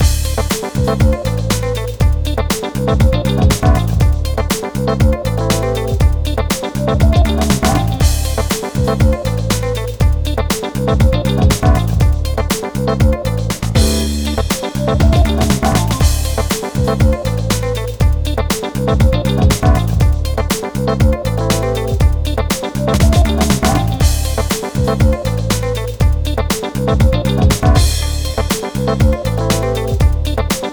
Funky Loop; Piczle Colors Demo

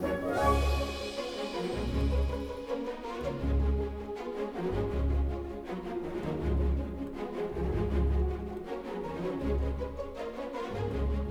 Music Cutscene Comic Loop; asterix obelix slap them all 2

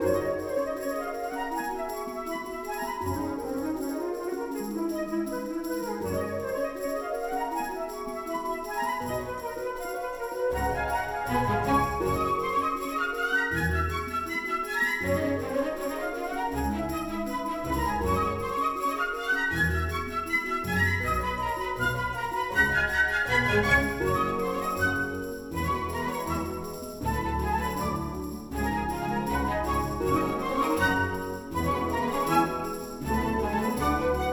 Joyful 80bpm Loop; asterix obelix slap them all 2; Music Dialogue

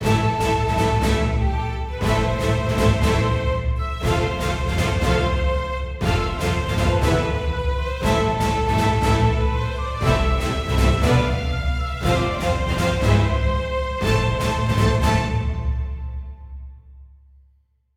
heroic forte

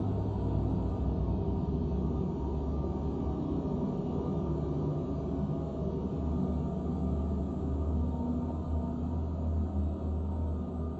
Swirling Atmosphere; PS4; Cat Interstellar

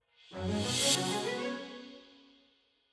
jingle battle intro; Sentry Knight Tactics

